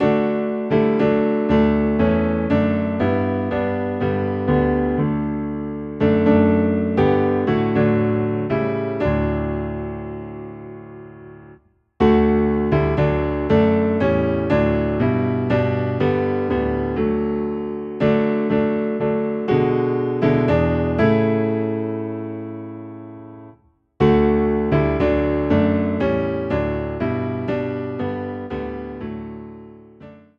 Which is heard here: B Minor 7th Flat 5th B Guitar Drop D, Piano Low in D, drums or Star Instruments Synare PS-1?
Piano Low in D